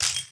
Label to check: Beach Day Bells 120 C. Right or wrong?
Wrong